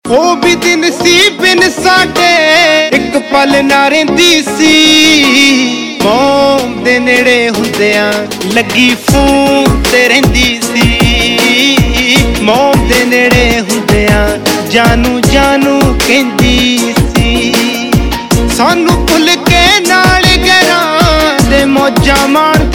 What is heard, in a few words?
Ringtone, Punjabi